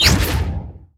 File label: hell portal